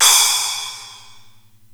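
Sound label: SPLASH, cymbal; 300 Drum Machines; Zoom RT-234; Kit C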